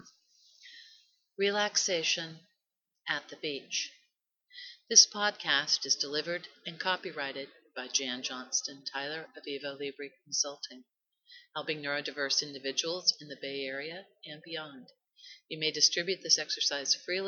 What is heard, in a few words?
Ocean Relaxation